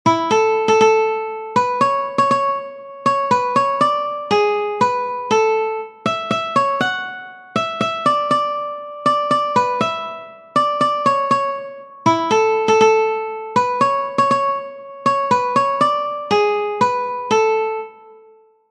O Christmas Tree Guitar One String

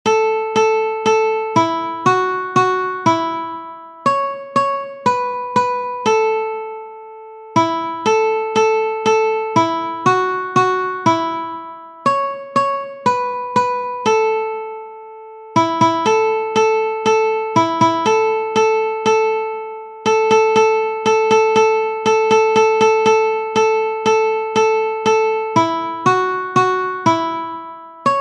Old MacDonald had a farm guitar one string

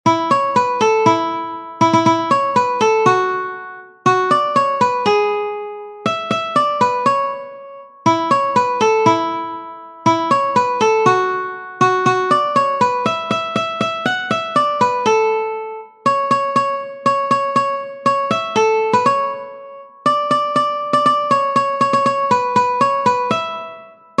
Jingle Bells Guitar One String

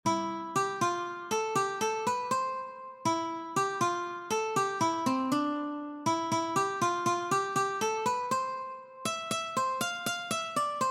Humpty Dumpty Ukulele

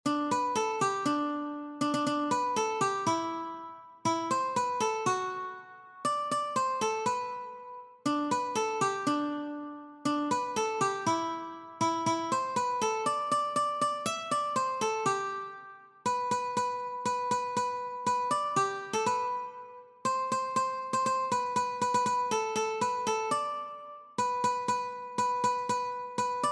Jingle Bells Ukulele

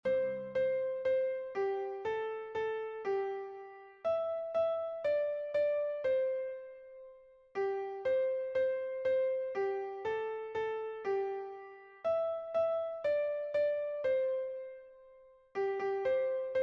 Old MacDonald had a farm Piano

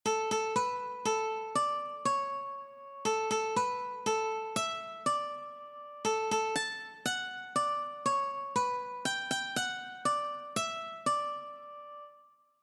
Happy Birthday Ukulele one string

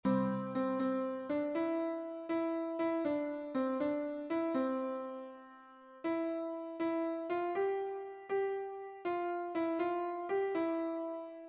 Itsy Bitsy Spider Piano

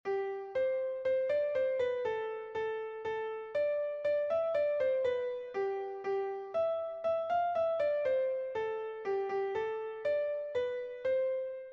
We wish you a merry Christmas Piano

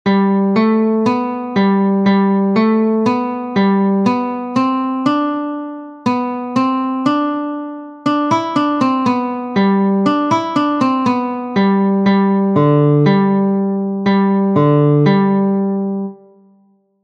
Are You sleeping Guitar Several String

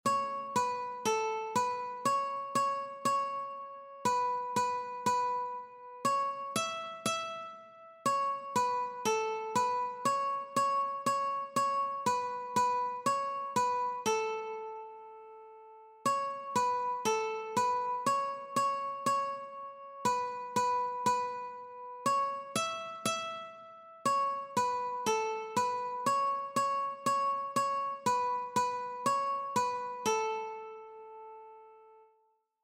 Mary had a little lamb one string

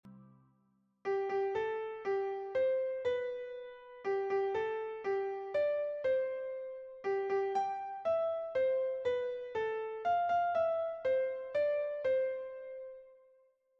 Happy Birthday Piano

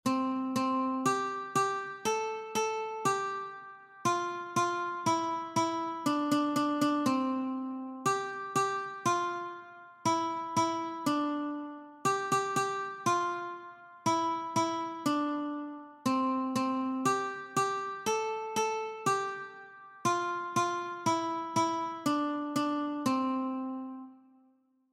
The Alphabet Song Ukulele

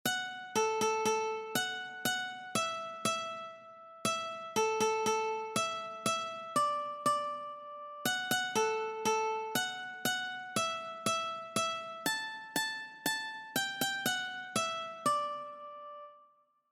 Five little Ducks Ukulele One String

